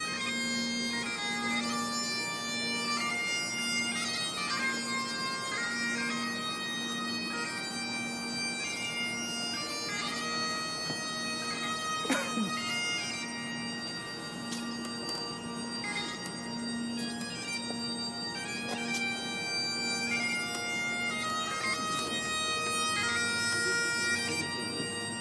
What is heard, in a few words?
bagpipes